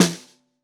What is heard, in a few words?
SN Bottom, Snare